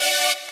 saw

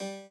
chord g

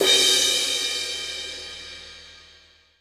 drum hit finish

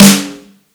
electronic

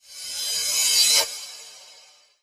reverse med

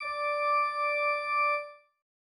organ

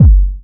normal hit clap; osu

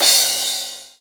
drum hit finish; osu

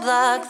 vocal

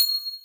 BELL

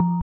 BELL

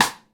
soft hit whistle

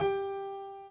piano intro

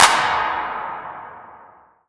CLAP Reverb; osu; Schatten Reverb

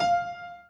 Piano Chord

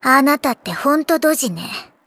applause; osu

